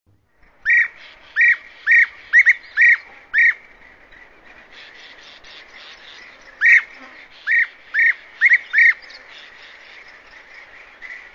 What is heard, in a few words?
European Bee-Eater